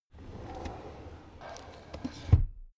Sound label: drawer cabinet crammed close soft